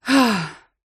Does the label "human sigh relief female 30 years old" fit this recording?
Yes